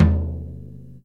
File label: tom; lmms; drums